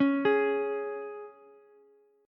Db 5th Db down Ukulele; Db5 arpeggiated; JGuitar

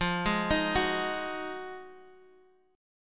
F Minor 7th Flat 9th; Fm7-9 Chord {X 8 6 8 7 X} arpeggiated

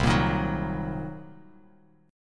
C Minor 7th Flat 5th, Bass Standard; strummed; JGuitar

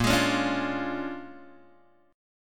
A sharp 7th Sharp 9th, Guitar, Open G

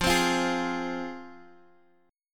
F Minor, F, Guitar; strummed; 13,15,15,13,x,x; 4E,4A,5D,5G,5C,6F

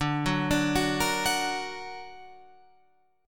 D Augmented D down Guitar Open F; D+ arpeggiated; JGuitar

